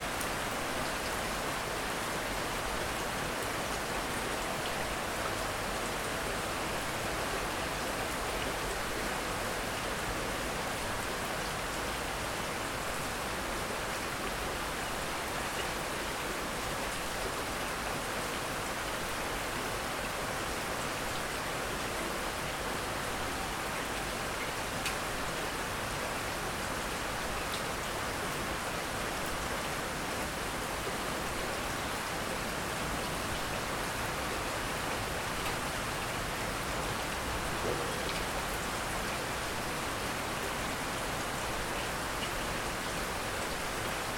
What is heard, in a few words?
rain